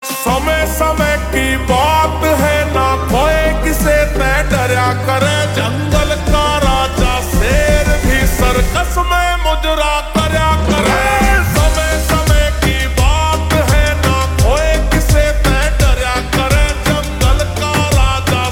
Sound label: Ringtone Slowed Reverb